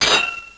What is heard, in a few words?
Grind; Sonic Parallactic Adventure